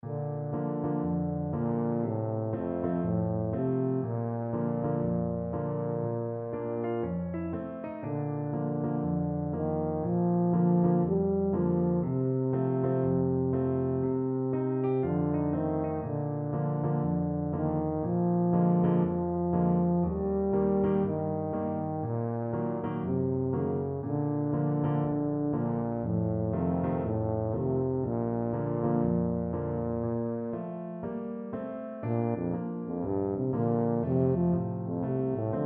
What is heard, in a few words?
careless love, tuba